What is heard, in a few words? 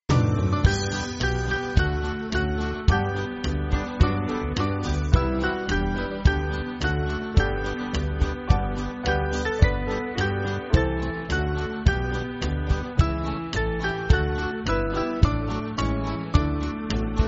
Band, church music